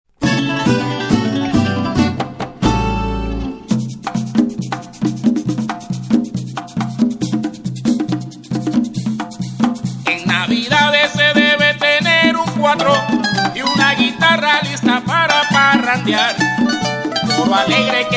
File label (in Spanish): Parrandon